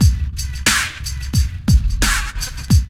LOOP; X-Static Goldmine 2; IMIX LOOP

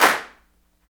CLAPS, Africa Handclaps

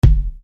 Bass Dr, EuroDance Bdrums

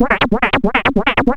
A#, FX; Big Fish Audio

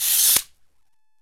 ROCK PERC.

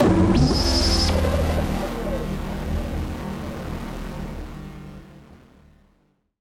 Malfunction Synth, malfunctioning synth samples

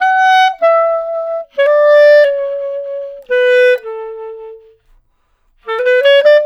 Clarinet Solo; Sonic Foundry (Sony Creative Software); Jazz Solos and Sections

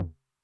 Mid Tom Low Tune Short Decay, 909; classic machines; 909mod; MT - MID TOM

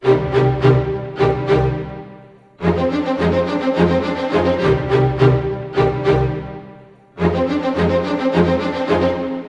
105 strings; Orchestral arrangements; Cmin; PowerFX - Downtown Orchestra

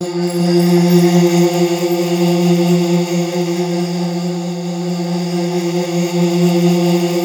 PADS

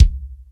FUSION KIT; Northstar - New Gold VOL-1

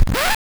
SFX Record Stop; Genesis

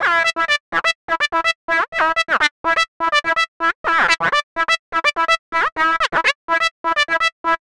125 Fluty Blipps E, Keys, Nu Jazz House; PowerFX - Nu Jazz House

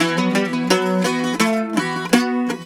Celtic Flavours; USB Soundscan vol.42; AKAI

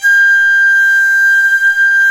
WND FLT MT, Flute & Mute

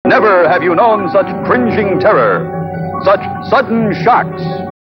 Cringing Terror, Spoken; Sonic Foundry (Sony Creative Software)

